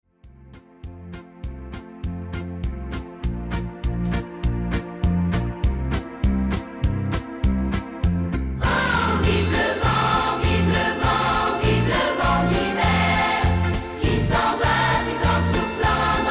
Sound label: Back Vocal, Karaoke